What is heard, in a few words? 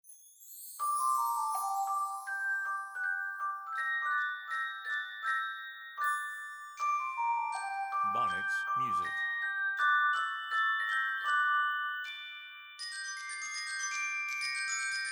orch